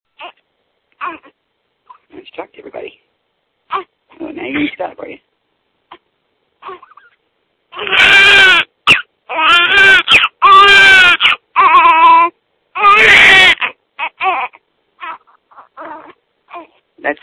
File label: crying